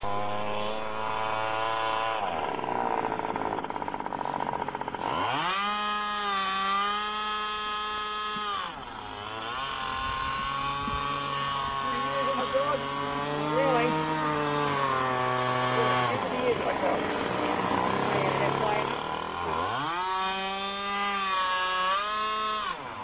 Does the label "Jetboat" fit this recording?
Yes